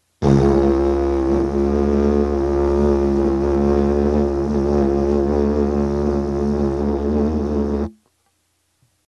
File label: didgerido